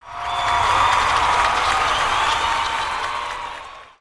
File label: Concert Crowd; Oneshot; ambience